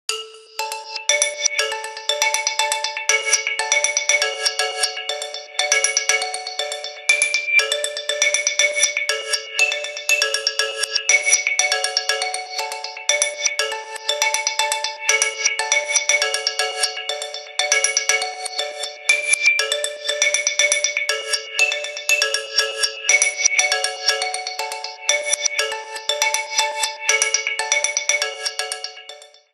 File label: Ring Abstracting, phonetones; HTC Ozone